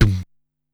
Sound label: "Thuum", Korg Wavestation-SR Drum Kit